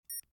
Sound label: beep; grubs; weapons; mine